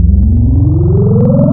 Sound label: PPG SWEEP, wavetables